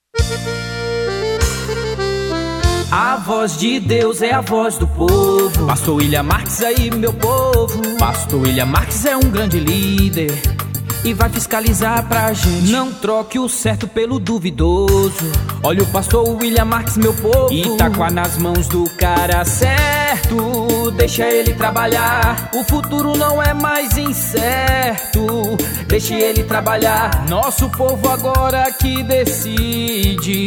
jingles politicos jingle a voz do povo forro gospel; Jingle Do Lula; Studio Lako

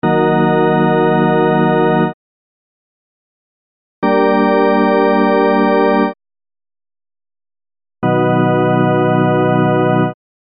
acordes maiores e menores eb g b a# f# f

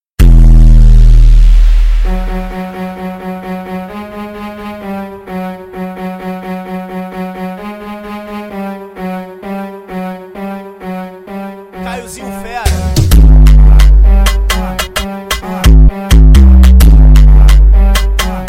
graves bass

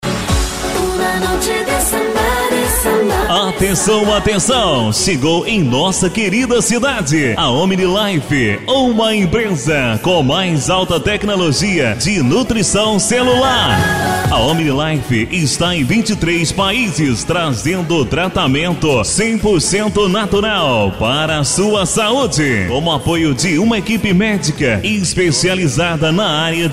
vinheta; omnilefe; nova iguacu

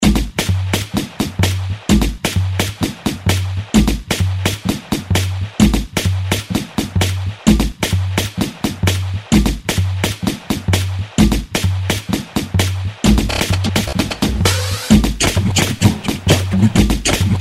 Base da Batucada

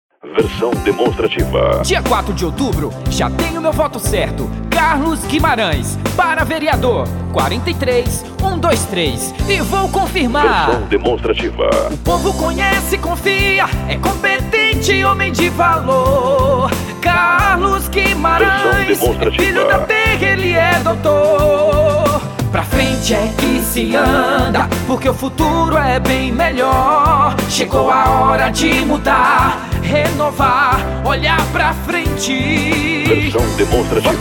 jingle politicos; Emotivacional; STUDIO LAKO

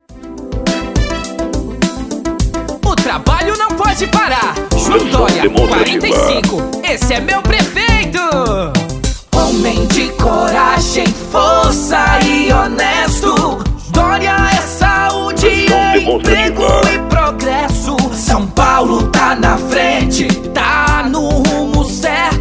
jingle inedito pop; joao doria

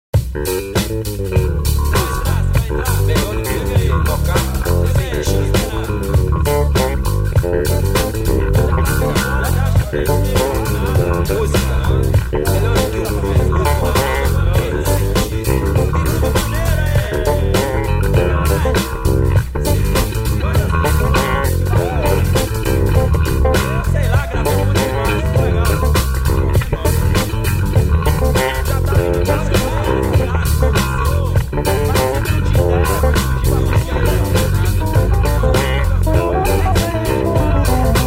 vinheta bassoul, jazz

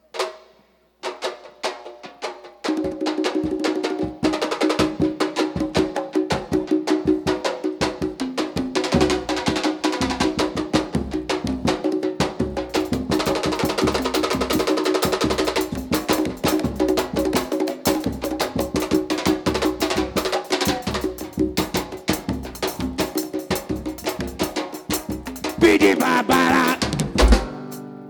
percursao

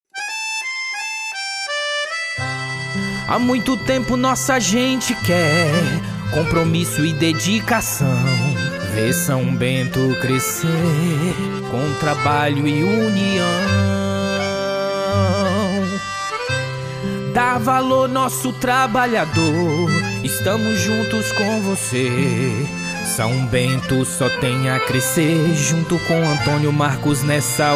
jingle vereador prefeito eleicao campanha politica

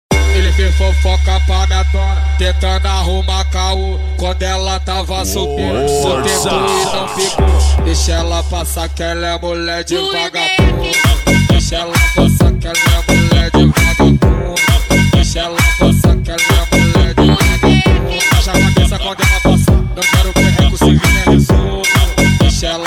eletro funk pancadão automotivo; eletro funk especial

